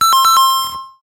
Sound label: Pausing; SMB3